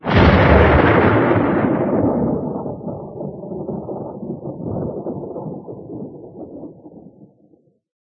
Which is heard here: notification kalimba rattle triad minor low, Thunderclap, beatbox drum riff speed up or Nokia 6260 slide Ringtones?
Thunderclap